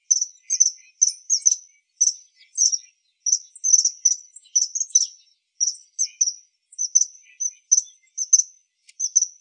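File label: kingfisher; kawasemi; kitagata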